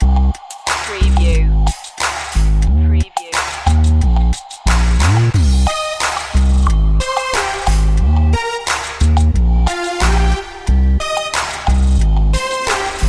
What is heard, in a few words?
trap it loop